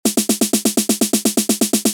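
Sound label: Snare fills Techno House; Toolkit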